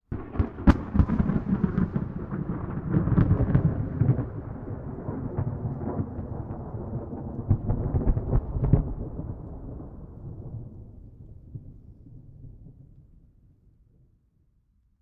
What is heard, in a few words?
Thunder close peeling; boom library; Thunder And Rain